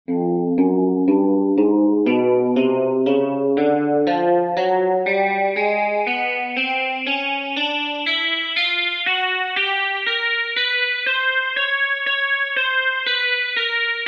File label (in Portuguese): Exercícios Guitarra 60 bpm